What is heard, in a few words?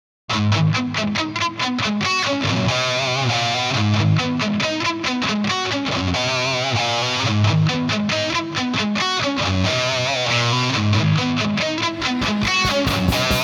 Gibson Bassman Heritage